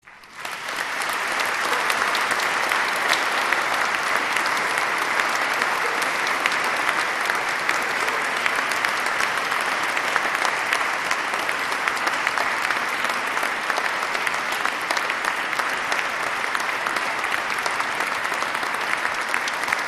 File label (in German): Applaus Unterstufenchor